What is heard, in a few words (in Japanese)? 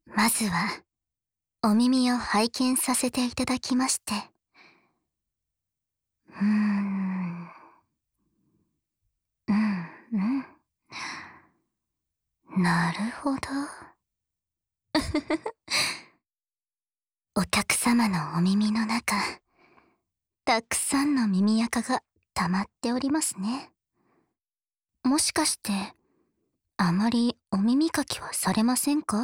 温泉で火照った体に癒しの右の耳かき, ASMR